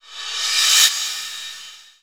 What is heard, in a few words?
Cymbals Reverse; Vengeance Samples